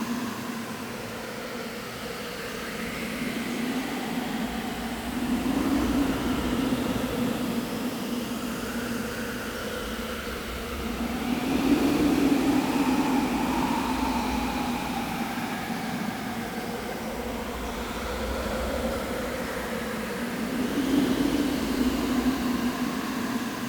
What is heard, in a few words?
windy ambience